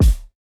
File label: kick, house